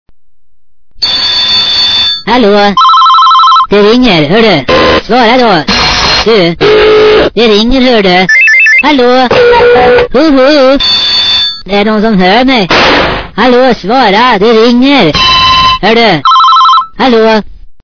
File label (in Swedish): Det ringer